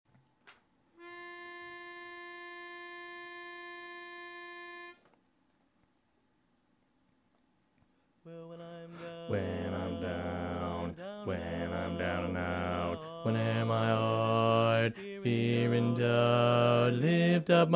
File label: BASS; Pennsylvania Groups